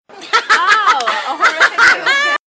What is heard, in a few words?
Is Calling Pop